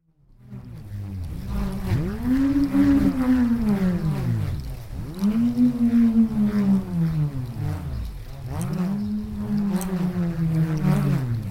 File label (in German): schwirrhoelzer; schwirrholz